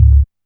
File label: Kick, Alesis DM5